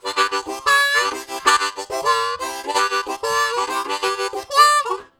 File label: Harmonica, WAV Riffs; Zero G